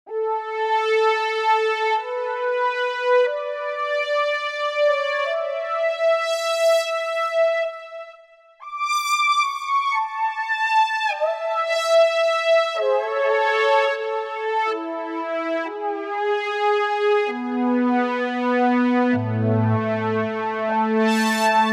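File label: GR500 lead; Keys; Roland SRX-07 Ultimate Keys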